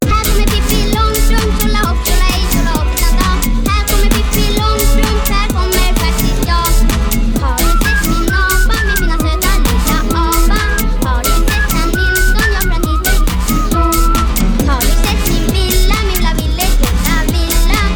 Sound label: Techno; Pippi Langstrump